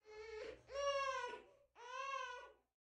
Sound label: Voice Cry